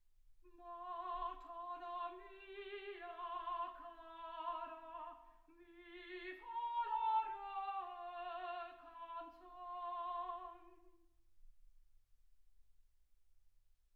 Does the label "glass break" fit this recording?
No